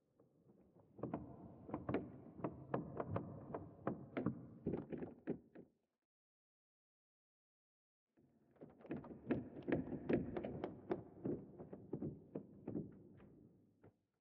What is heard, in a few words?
Misc Wood Creaks